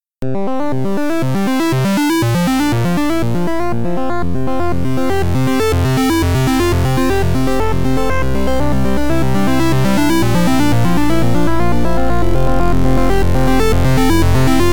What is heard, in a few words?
ARP; Arp CBbFF